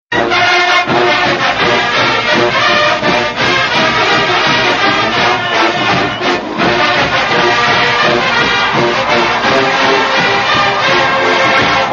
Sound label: Jazz Fight Song